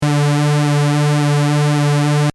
pwm

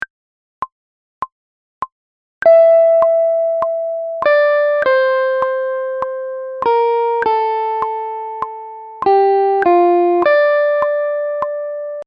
blue lead

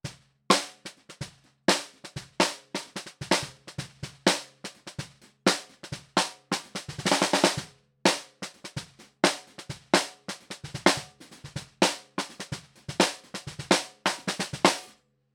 SNARE BOT

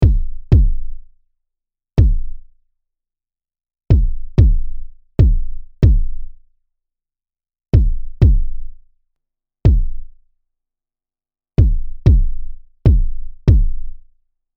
both kicks

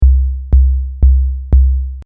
kick sine

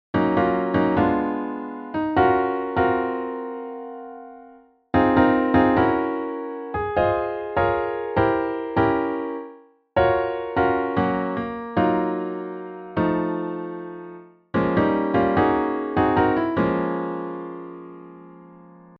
chords

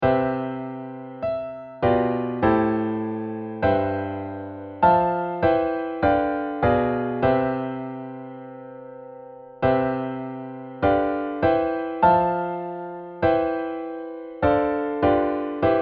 abide voicings

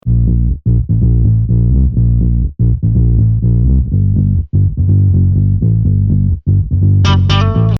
Bass

